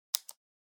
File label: Mechanical Pen Click